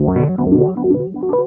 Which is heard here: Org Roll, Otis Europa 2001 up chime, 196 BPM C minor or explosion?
Org Roll